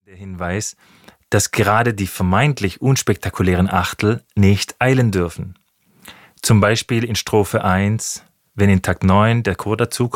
Rhythmus

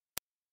kein Playback, a cappella

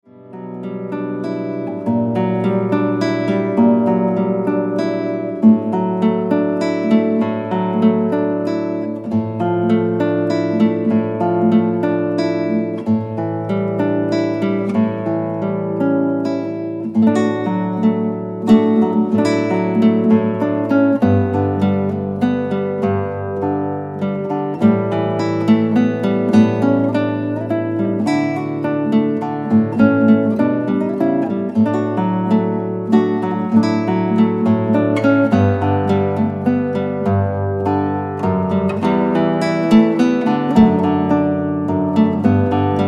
Erinnerungen Instrumental

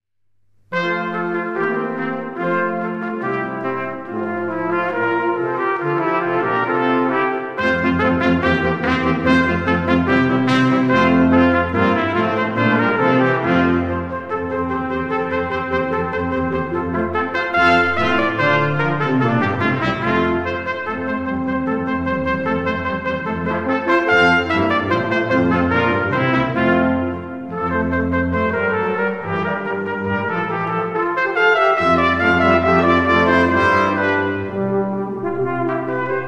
Sinfonie fuer Blaeser F-Dur Allegro

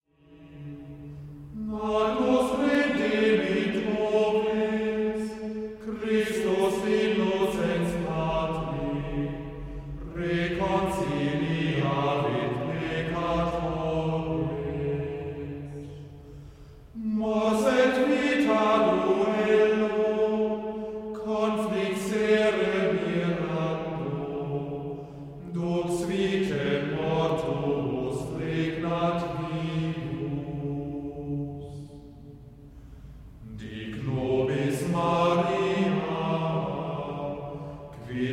Chor

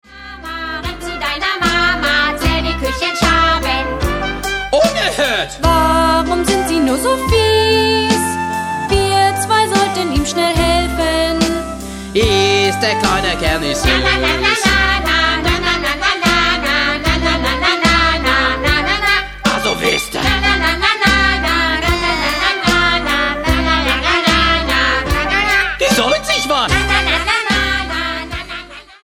Lied

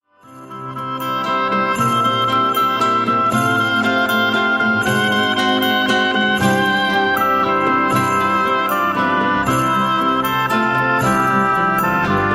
Pastorale in F-Dur

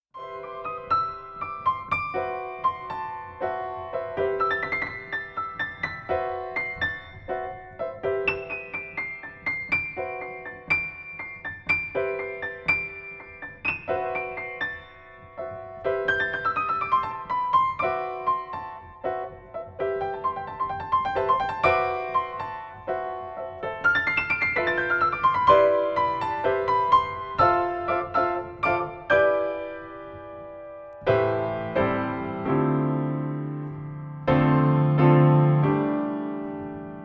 Piano-Meditation

